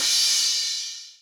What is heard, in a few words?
Cymbal, Hip-Hop